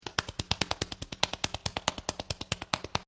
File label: run loop